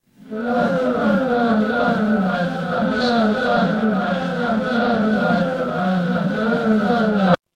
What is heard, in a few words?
crowd gasp